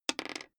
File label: die roll table